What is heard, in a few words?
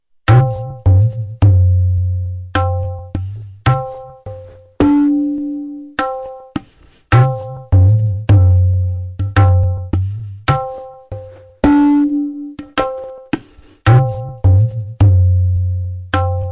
dadra vilambit 53bpm